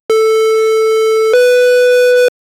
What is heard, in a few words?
electric retrowave